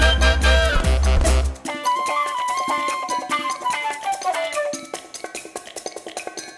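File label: food world music paradise suspens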